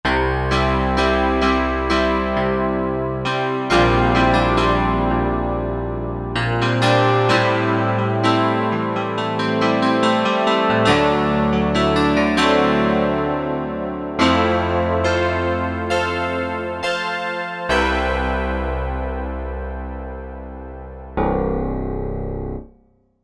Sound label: Rock Tine Piano, Korg Wavestation A-D